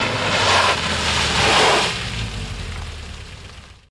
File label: Car Skid On Gravel